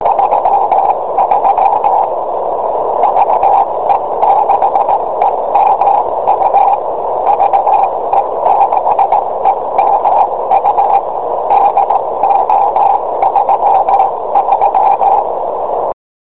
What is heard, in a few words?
6m CW; n1bug